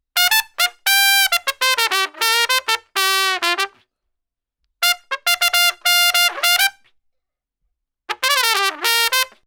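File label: Trumpet Solo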